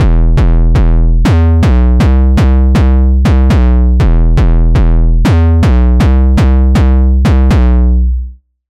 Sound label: 808 Half Step; Sylenth1; Routed Sound; Sylenth1 Expansion - Street Vanquisher Vol.1